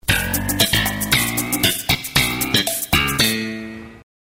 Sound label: Sitcom Bass Riff